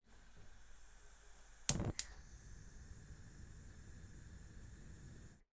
household oven gas hob ignite

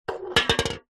food drink beer can empty knock over table

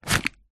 foley cigarette packet open

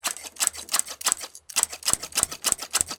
household garden shears cuting bush; sound effects one

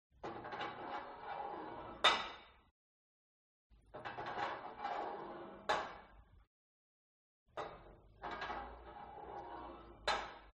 SPORT GYM Put Light Weight Onto Barbell Soft

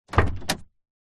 truck small door open handle plastic, vehicles

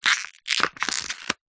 packet battery empty plastic squeeze; zapsplat

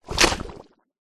cow pat poop land ground

